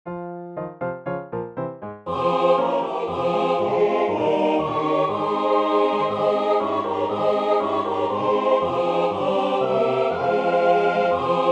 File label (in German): Zigeunerlieder Tutti